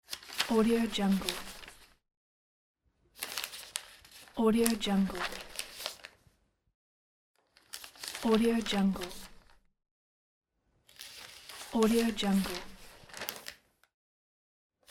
newspaper

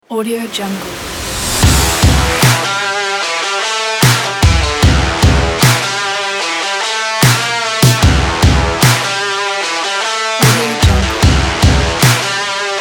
dirty rock

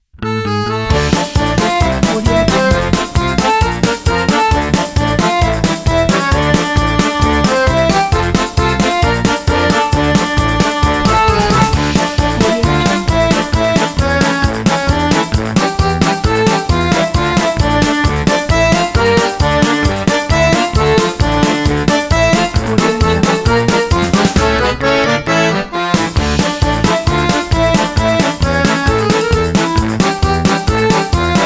happy polka comedy

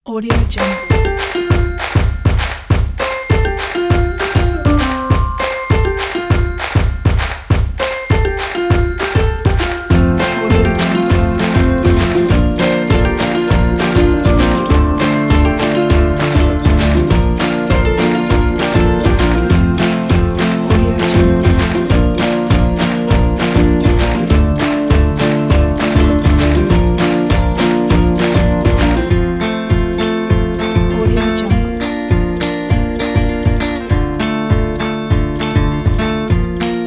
road trip

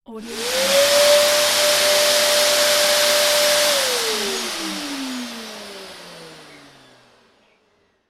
cleaner; crisstyna

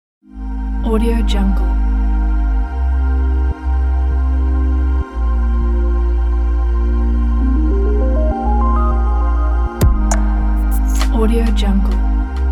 for relax chill spa

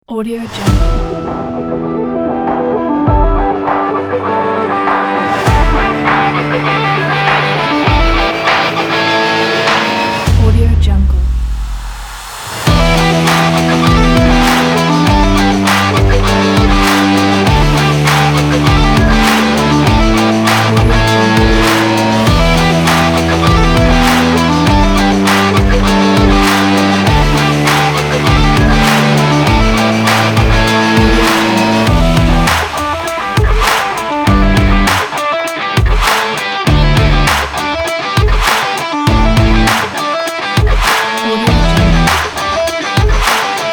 epic sport rock

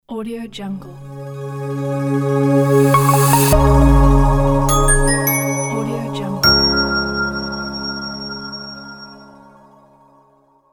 business logo

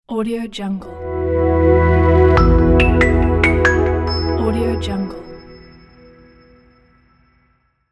pluck logo

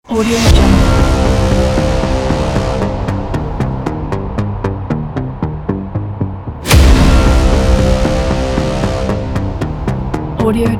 cinematic films trailer

